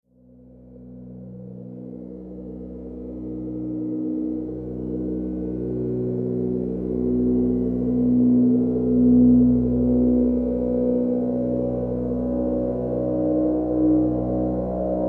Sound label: Wind And Drones, analogsynth; electroluminescence